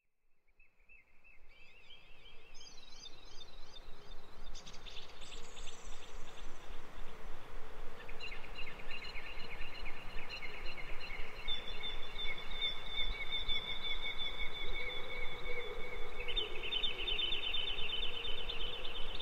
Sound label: Auditory Hallucinations - Distorted Forest; auditory distortion